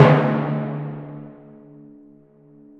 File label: Hit Hrd, Drum Machines, E-MU Proteus-2000